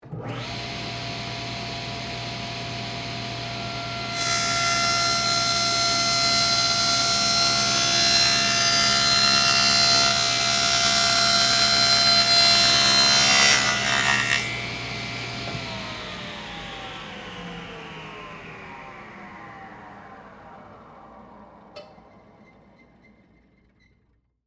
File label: Table Saw Cut Wood, Industry, Table, Saw, Cut, 1X4, Wood, On, Run, Off; Motors